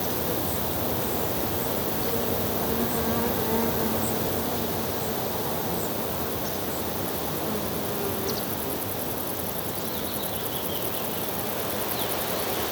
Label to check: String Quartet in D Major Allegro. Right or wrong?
Wrong